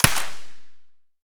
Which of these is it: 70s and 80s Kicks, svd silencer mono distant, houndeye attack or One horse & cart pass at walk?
svd silencer mono distant